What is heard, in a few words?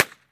knotholes, Ticks, Misc Percussion